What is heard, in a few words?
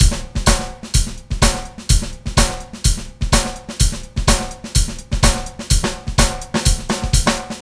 SAMBA, 126, Dance Trax loops